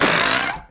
taunt luxury lounge chair creak; video games; source engine; tf

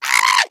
hot laser; player

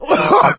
cough, player survivor voice

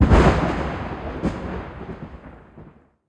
thunder; source engine; episodic

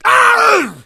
hunter pounce; player; voice; attack; source engine; left 4 dead 2

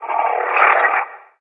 advisor screen; episodic; Source engine

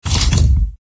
robotz maximize, windows sound schemes; windows 9x

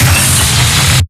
giant heavy gun windup; source engine; tf; mvm; video games

